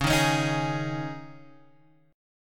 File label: Db Suspended 2nd Guitar Open G